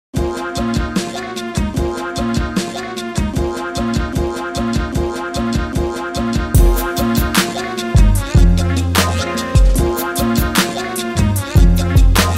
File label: rap